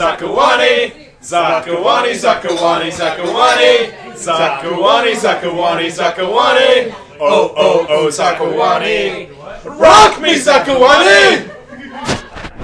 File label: new song